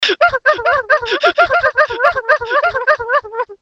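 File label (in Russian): Smeh, laughter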